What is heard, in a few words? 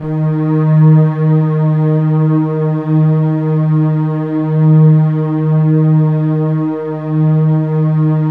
Keypad; Standard Instr; Keypad 2